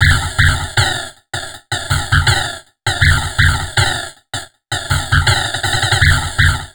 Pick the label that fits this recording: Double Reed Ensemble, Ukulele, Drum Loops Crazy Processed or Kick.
Drum Loops Crazy Processed